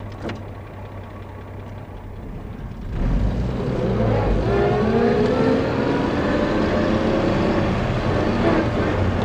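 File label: Chevy Truck 46 Idle Away In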